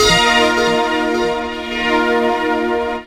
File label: Beep Synth; Detroit Beep Synth; M8; Riffs, Arps & Hits